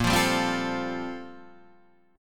G Minor 11th A sharp

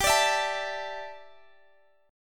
E 7th Suspended 4th G; strummed

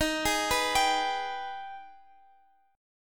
Ab Minor Major 7th

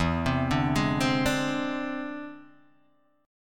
E Augmented 7th E down Guitar DADGAD

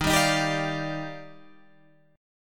D sharp Major 9th, Guitar Open G

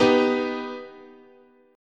B Augmented, chord

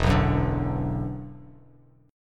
E 7th Suspended 2nd, down, Bass Standard

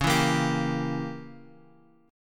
Db Diminished 7th Db down Bass Standard; strummed; JGuitar

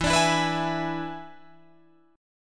E 7th E down Guitar Drop D

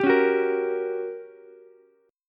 G Minor Major 7th Flat 5th, G, down, Ukulele; strummed; JGuitar

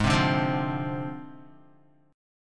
Ab 6th Flat 5th down Guitar; Ab6b5 strummed

